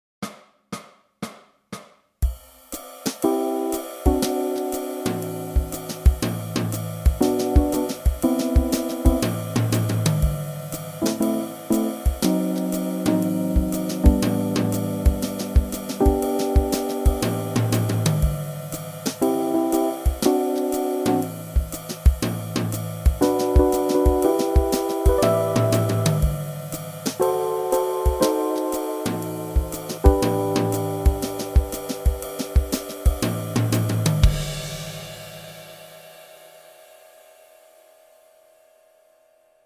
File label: Backing Track